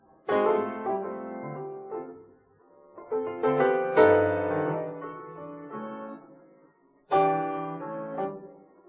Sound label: piano